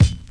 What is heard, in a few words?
lofi kick; Kicks; single hits